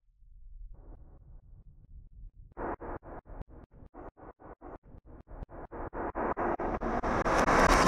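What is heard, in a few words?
Reverse Breaking Down Slowly; Cinematic Metal Impacts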